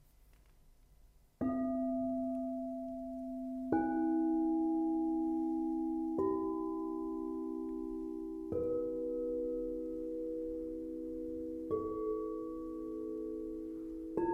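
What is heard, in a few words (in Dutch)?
7 chakra set normaal beschadigd, witte kristallen schalen; 7 chakra witte kristallen schalen in twee tassen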